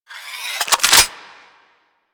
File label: Shotgun Reload Spin Stop; DE; Warframe; Weapons; Skins; Zoboz Shotgun Skin